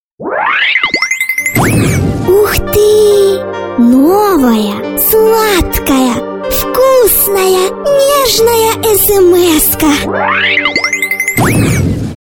novaya sladkaya smska

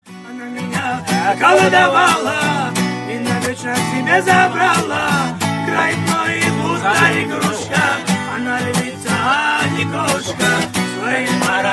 cover gitara

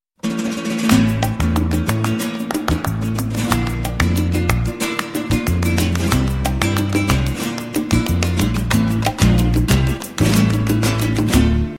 Ритмы Фламенко

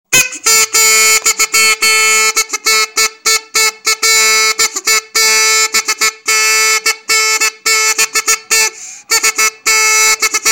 muzikalnaya igrushechnaya truba

